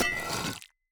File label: wax off, axe; Minecraft